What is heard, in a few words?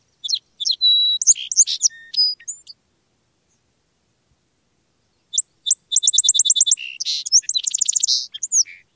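songs, Song Sparrow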